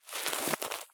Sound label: turn grass low; Escape from Tarkov